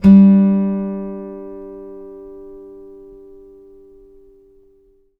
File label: 5ths G3, Blues Guitar; ILIO; Fretworks